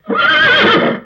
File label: Horse Whinny, Neigh